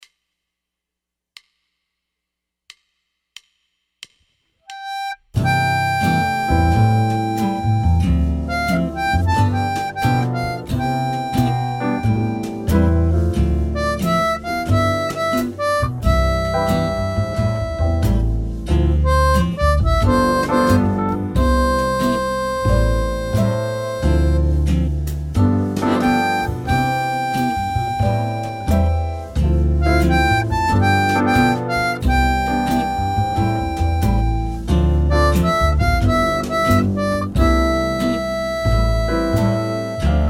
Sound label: C 90 harp